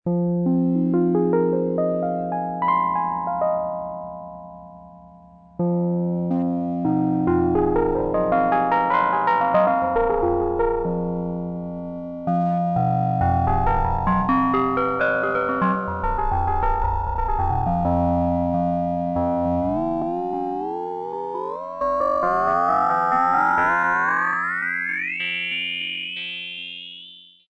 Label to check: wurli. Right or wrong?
Right